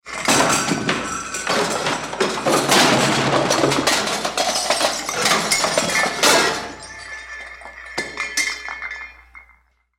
household dishes crashing to floor; sound effects one

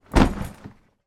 suitcase large down on surface; foley; zapsplat

